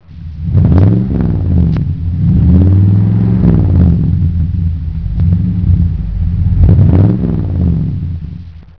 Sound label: Facel